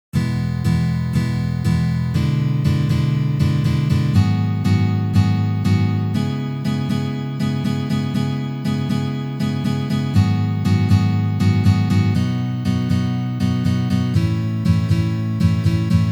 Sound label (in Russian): Uprazhnenie c akkordom E7